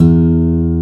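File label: E1 MARTIN, acoustic guitar; AKAI S6000 CD-ROM - Volume 1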